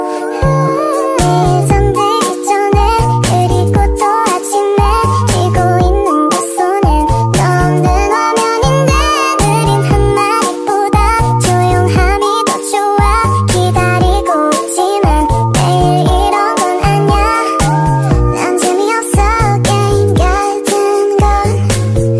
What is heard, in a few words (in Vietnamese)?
Nhac Chuong Speed Up